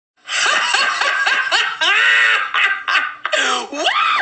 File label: Obnoxious Laughter